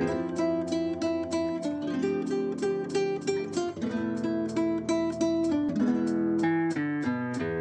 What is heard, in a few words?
guitar bolero, Jazzmatazz loops